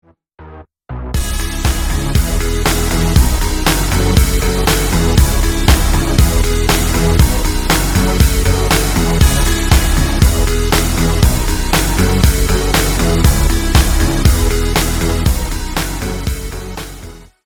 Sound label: Instrumental